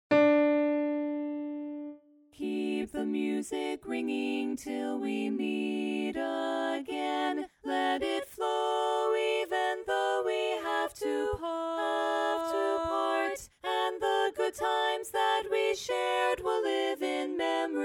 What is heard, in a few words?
Bass Missing